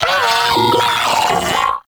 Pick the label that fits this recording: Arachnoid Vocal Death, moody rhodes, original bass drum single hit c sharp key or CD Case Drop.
Arachnoid Vocal Death